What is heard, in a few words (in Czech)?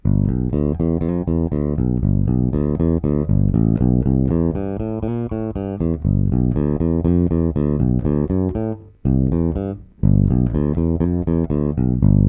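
Warwick RockBass Infinity 5 Fretless, Thomastik JR345, jednocívka - jednocívka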